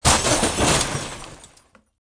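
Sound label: riot bang, panic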